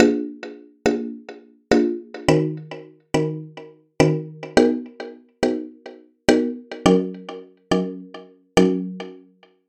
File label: Chords Rhythm Latin; midi wizard